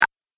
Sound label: Game Power Switch, video game